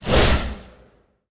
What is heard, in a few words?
fire; Smite; Tiamat